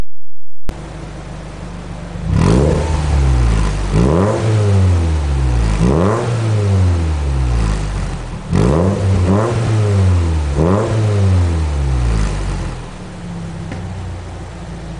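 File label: super drager 3SGE, muffler, TOYOTA CURREN; HKSスーパードラッガー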